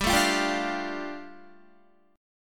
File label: G 7th Suspended 2nd, Guitar; G7sus2 strummed